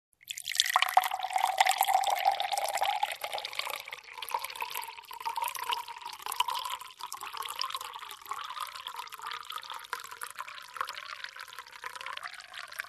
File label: Pouring water in a glass